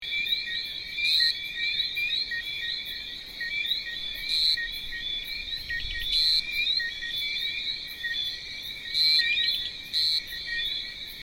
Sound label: Podoscirtidae